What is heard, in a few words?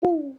subtle pop; Mumble